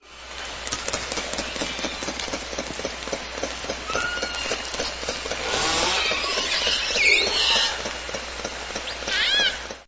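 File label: southern resident killer whale clicks and calls; Salish Sea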